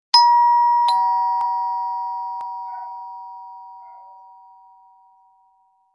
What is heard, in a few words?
Door Bell